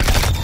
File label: Pew